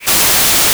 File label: spray; panicpumpkin